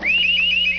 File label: ring in